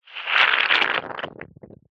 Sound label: foley liquid frothy squelch